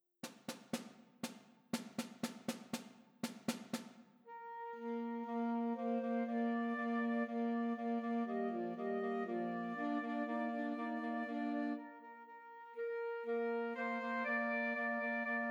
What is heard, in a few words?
Christmas Trios for Flute Alto Sax and Tenor Sax